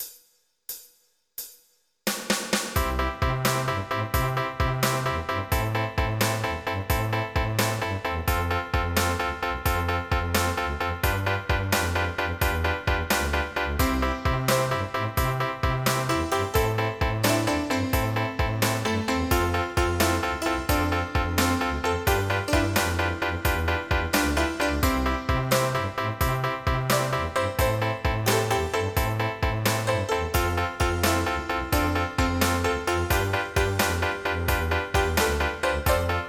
50s rock

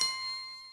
Tick

